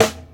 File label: natural snare e key